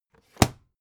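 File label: aluminium case small close short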